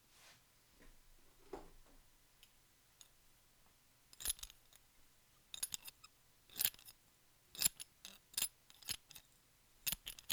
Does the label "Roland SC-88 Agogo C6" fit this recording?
No